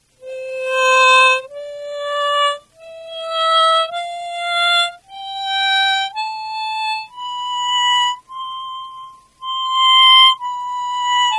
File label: gamma, garmoshki